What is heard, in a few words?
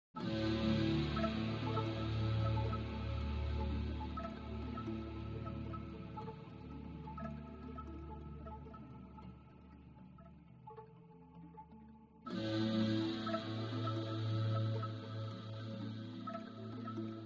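Pad Ambient Choir; Syntheway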